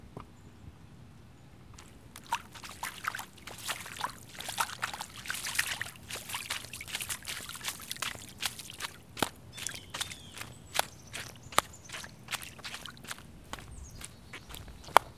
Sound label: Water Walking Running